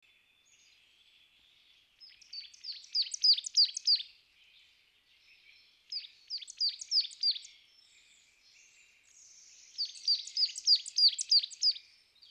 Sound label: Poecile palustris; SETE